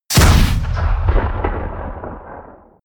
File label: rpg shot close